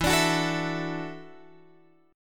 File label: E 7th Suspended 2nd Suspended 4th Guitar Open F; E7sus2sus4; strummed